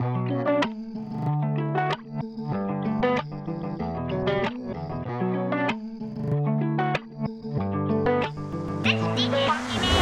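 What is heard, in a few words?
Run, bpm190 D major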